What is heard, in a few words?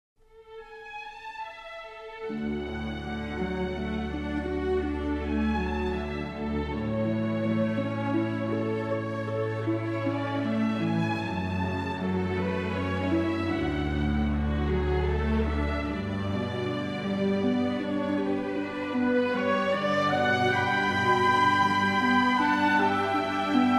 haunted